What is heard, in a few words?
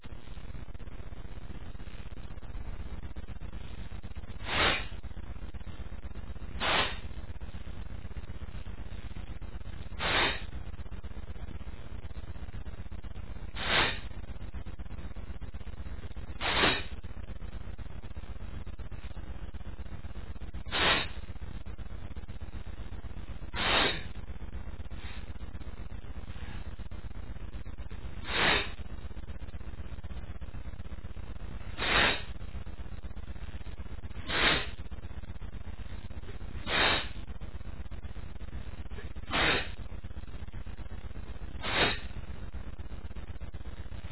incense, male, achoo